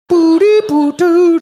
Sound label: singing couple of musical tones